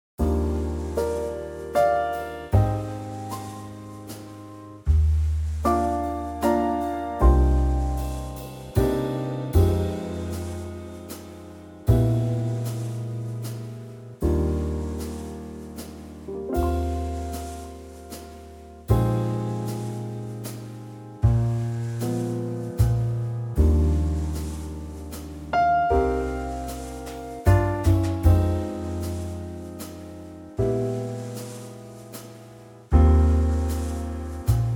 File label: Eb trio